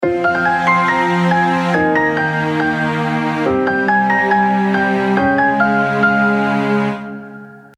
Hip-Hop Trap Bounce, piano

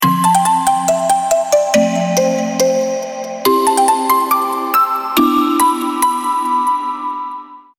Melody Plucks

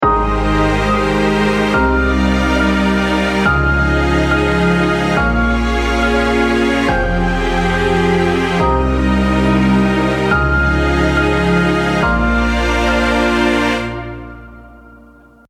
Emotional Beautiful Bounce, piano

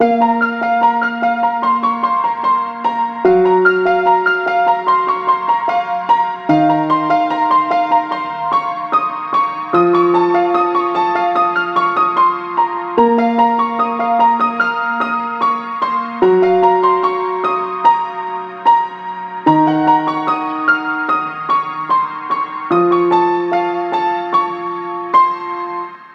Emotional Melody Dark Trappy 148BPM, piano

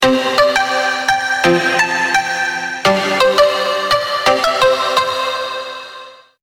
Melody Plucks

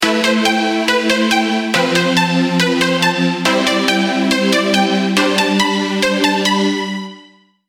Melody Plucks

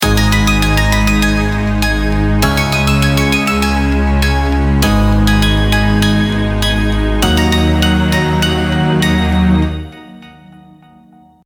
Hip-Hop Trap Bounce, piano

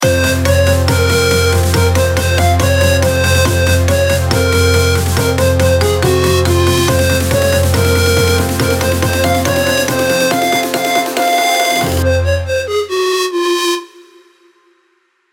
Hip-Hop Trap Bounce, piano

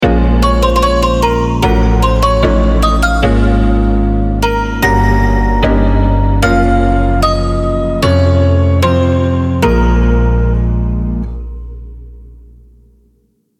Dark Sad Bounce, piano

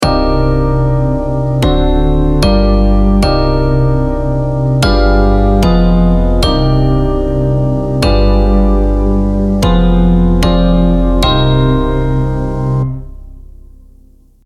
Dark Sad Bounce, piano

